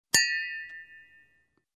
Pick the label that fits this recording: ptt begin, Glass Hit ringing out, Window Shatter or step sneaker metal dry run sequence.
Glass Hit ringing out